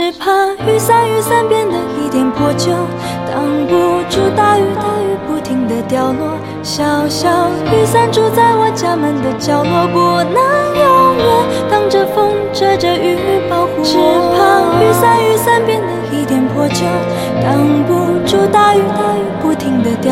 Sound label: S-pop